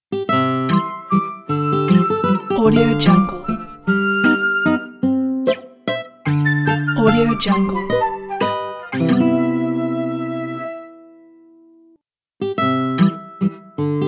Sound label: optimistic ident